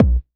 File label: TR909 Kick, Roland SH-32 Drumset